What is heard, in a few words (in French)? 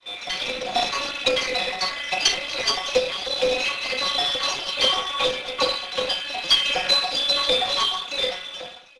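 clochette troupeau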